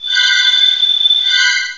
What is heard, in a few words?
cry; source of the sovereign